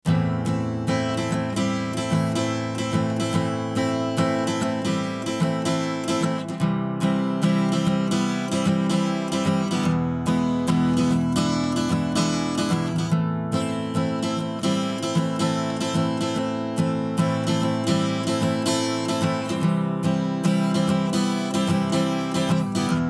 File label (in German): Western Gitarre Ratio 4 zu 1; Black Lion Audio Bluey